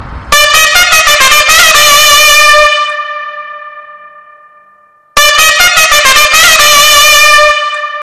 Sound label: indian theme music